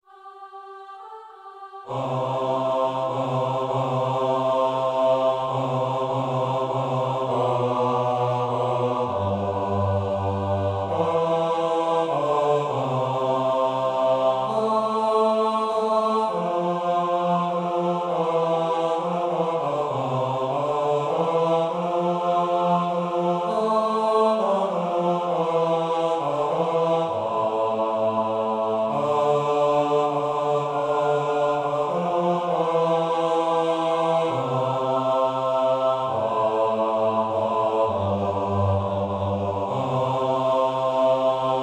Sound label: Bass; Silent Night